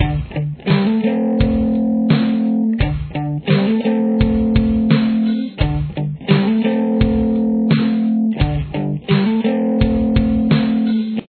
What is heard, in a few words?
main riff guitar